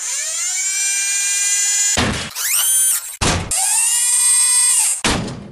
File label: upgrade